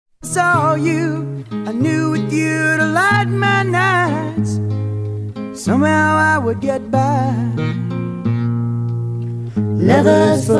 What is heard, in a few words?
Vocal Mix